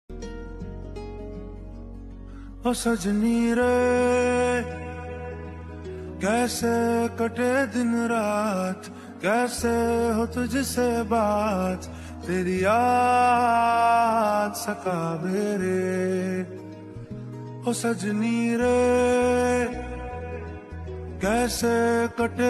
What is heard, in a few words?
romantic ringtone song ringtone